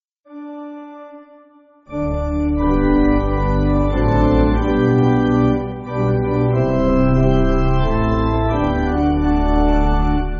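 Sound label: Pipe, Pipe Organ, Music Backing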